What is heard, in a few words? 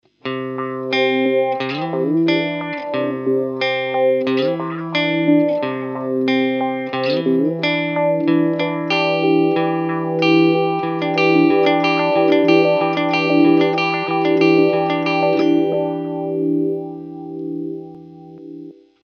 Sweep echo